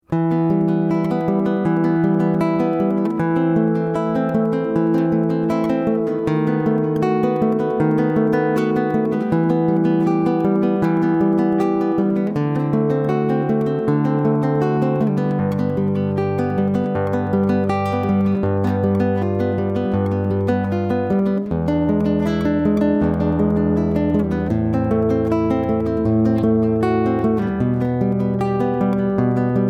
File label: e minor arp